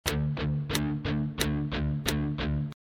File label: This is D5 played eight times over the course o; Power Chord Lesson